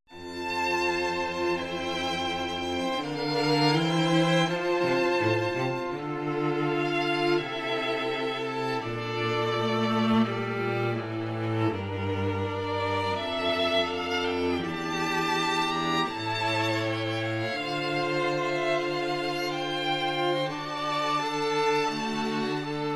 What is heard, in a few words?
Fine Strings Quartet